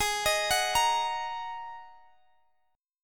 G sharp 7th Suspended 2nd

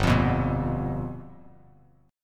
G 7th Sharp 9th G chord, Bass Standard; G7#9 strummed; JGuitar

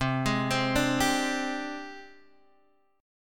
Ab Major Sharp 11th C

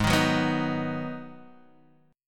Ab Minor 7th, down, Guitar, Half Step Down

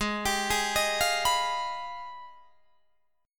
Ab Minor Major 13th, down, Guitar, DADGAD

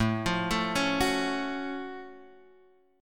F sharp Minor Major 7th A

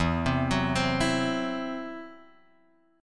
E Suspended 2nd Sharp 5th, Guitar, Drop D

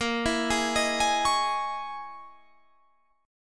C sharp Minor 6th Add 9th A sharp

